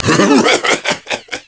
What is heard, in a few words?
Laugh; X6; Sigma